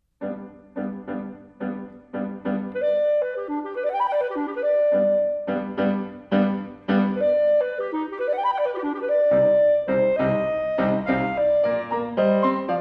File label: Sonatine for Clarinet and Piano - Mvt. 3. Poco Allegro